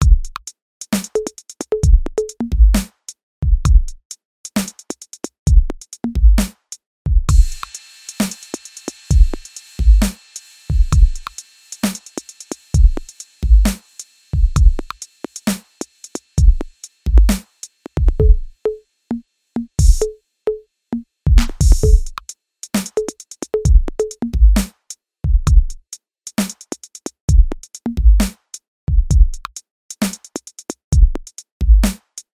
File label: tape drum machines 65hz